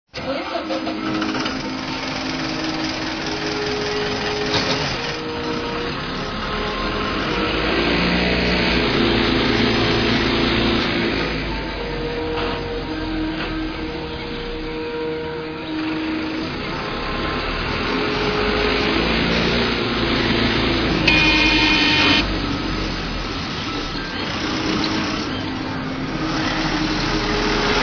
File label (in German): Tonmuster Tm 2 2